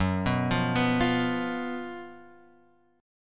F sharp Major 7th Suspended 4th; F#M7sus4 Chord {2 2 3 X 0 1} arpeggiated

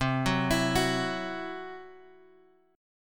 C-Major Flat 5th, guitar; CMb5 Chord {X 3 4 X 5 2} arpeggiated

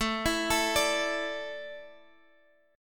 E 7th Suspended 4th

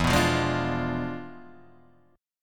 E 7th Flat 9th, E7b9 chord; strummed

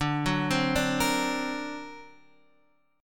D Augmented 7th D down Guitar Standard; D+7 arpeggiated; JGuitar

